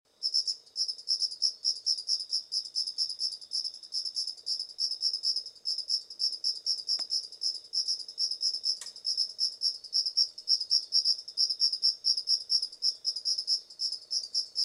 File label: tsuzuresase